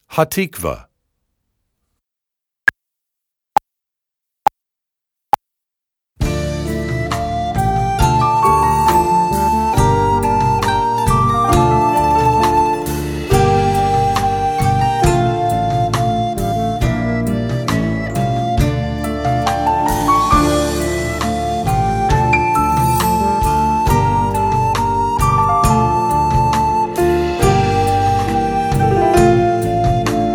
Hatikvah com flauta guia; Obra Obrigatoria Nivel 4

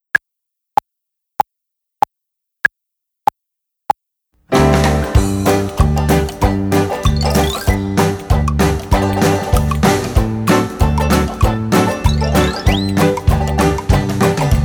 Dry Bones Sem Flauta Guia; Nivel 1; 1.o Ano de Escolaridade; Obra Obrigatoria; 11o concurso de flauta de bisel municipio de redondo

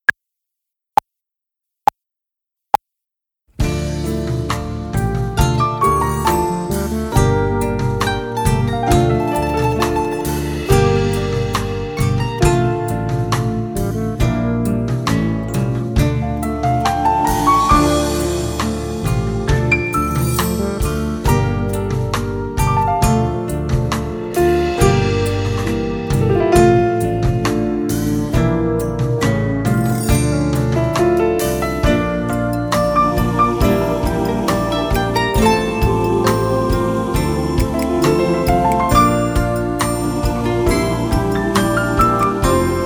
Hatikvah sem flauta guia; Obra Obrigatoria Nivel 4

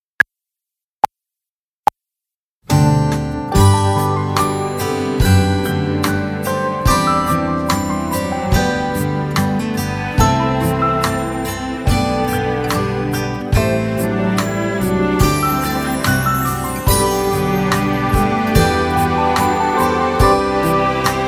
Simple Gifts Sem Flauta Guia; Nivel 4; 4.o Ano de Escolaridade; Obra Obrigatoria; 9o concurso de flauta de bisel municipio de redondo

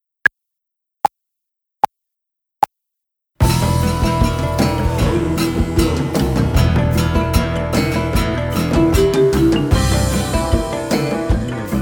Tribal Dance Sem Flauta Guia; Nivel 2; 2.o Ano de Escolaridade; Obra Obrigatoria; 9o concurso de flauta de bisel municipio de redondo

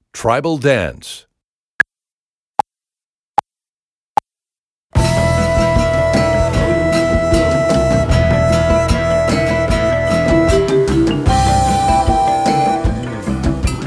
Tribal Dance Com Flauta Guia, Flauta de Bisel; Nivel 2; 2.o Ano de Escolaridade; Obra Obrigatoria; 9o concurso de flauta de bisel municipio de redondo

